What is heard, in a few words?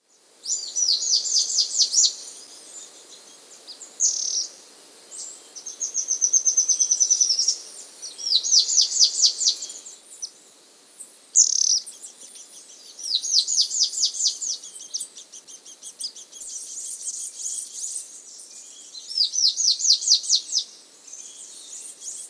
Russet Antshrike; Thamnistes Anabati; S. America